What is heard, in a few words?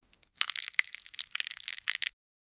olives transform to a pla, transformation